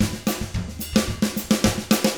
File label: 110 SAMBA, Drums; ILIO; Double Platinum Drums 1